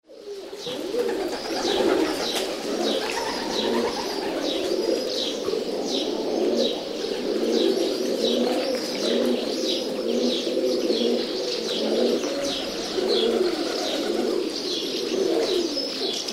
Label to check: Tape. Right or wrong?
Wrong